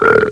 burp; TermSFX

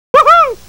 woo hoo

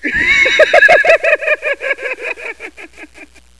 laugh; DrMozek

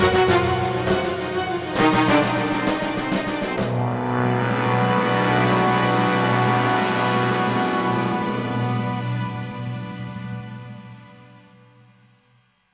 battle outro; phoenix

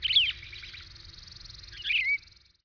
bird, Ambient; ZEUS

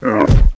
CAMEL; CAMELDEF

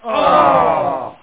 mourn; Ultimatum

